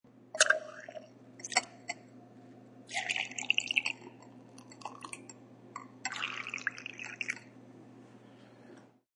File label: Weak Beer Tap, Pouring beer from weak tap; Bars